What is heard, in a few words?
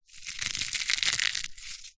egg shell squeeze crack hand; food